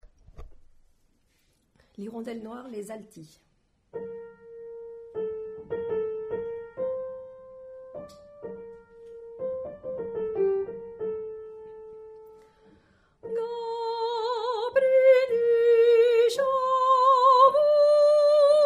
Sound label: Alto, Chansons géorgiennes